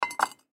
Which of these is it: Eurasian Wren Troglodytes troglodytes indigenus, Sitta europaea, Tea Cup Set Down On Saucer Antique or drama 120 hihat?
Tea Cup Set Down On Saucer Antique